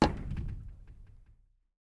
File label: glass land